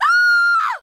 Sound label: Scream Female; Blastwave FX